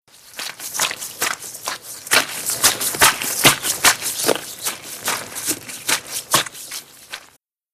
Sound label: Cutting Flesh, gore stabs